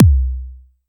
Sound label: Tempest 808 Style Kick, Drum Machines